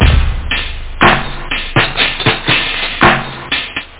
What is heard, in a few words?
jungle, loop; ahh loop without ahh